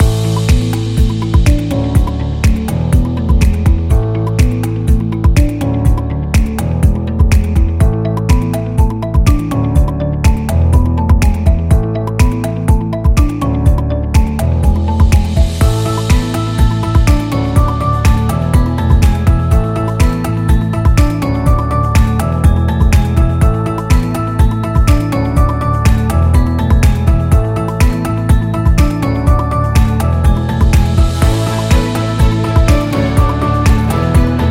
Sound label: Corporate Loop Upbeat; explainer